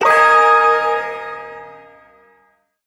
Ersatz Shawzin Yo Chord H; Warframe; Duviri

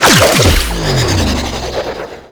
Sentient Vessel Parazon Leap; Warframe; Tau

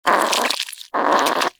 Vocal Snore, Critters; Characters; Hunting Critters; Warframe; Nexifera

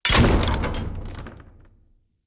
Chop Entrance Impact; Sinecure

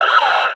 Enemy Pain; Warframe; Lasrian; Battle Barks

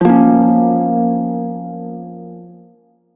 Prime Shawzin Hirajoshi Chord, Music; Warframe

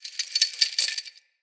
Chain Creak; Ambience; Under City

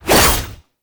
Naginata Whoosh, Weapons Melee

